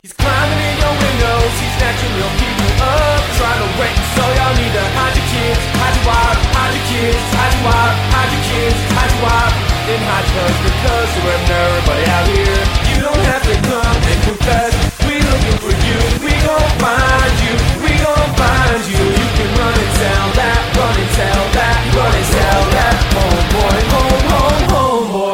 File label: Metal